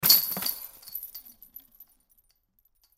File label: Chains Fence Shake Pad Lock Impact; Shaking Sound Effects; Blastwave; Foley